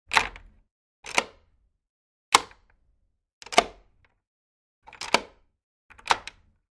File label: Keys, Lock, Unlock A Wood Door x6